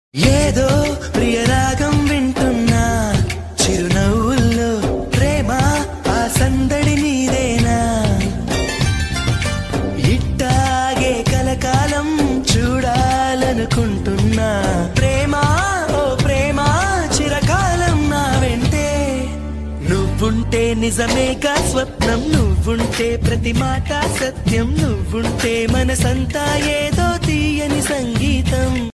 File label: ringtone bgm; arya movie